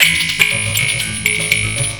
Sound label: MARIMB 120 BP, RHYTHM LOOPS; Rarefaction; Poke in The Ear With A Sharp Stick